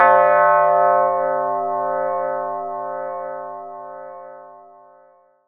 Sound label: Church Bells; Roland M-OC1